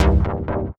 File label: Synth Cuts; Vengeance Samples; VES1 Instruments